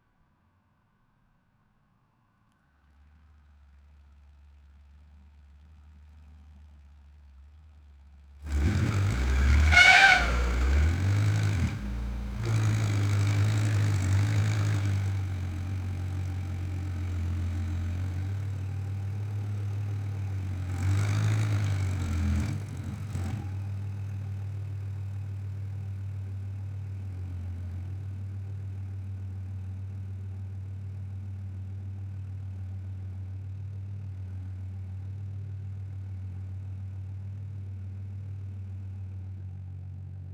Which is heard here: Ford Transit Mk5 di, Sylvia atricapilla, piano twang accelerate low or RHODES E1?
Ford Transit Mk5 di